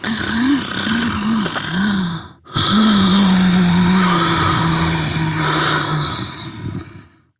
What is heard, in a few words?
Snores, Mother, Vocalizations; Warframe; Deimos